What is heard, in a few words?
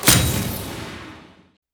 pilot kill; Titanfall 2